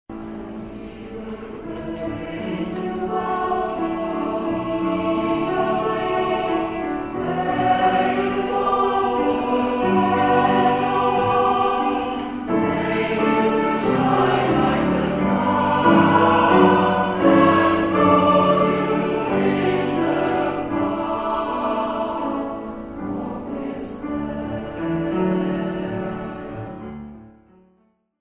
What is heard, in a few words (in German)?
Lehrerchor; holbein gymnasium